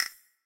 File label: bet chosen; Skillzgaming; fruit Blast Desktop